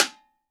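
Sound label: music box, handy percussion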